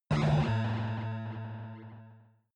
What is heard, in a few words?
protective shield hit absorption buzz